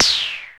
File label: Snaredrum, Roland System-100